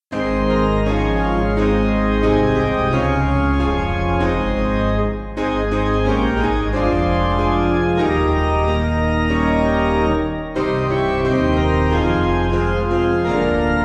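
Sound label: Piano, church backing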